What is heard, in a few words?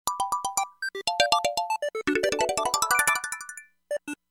Funny Alarm, Yamaha SMAF ringtones; Yamaha SMAF demo ringtones; SMAF Entertainment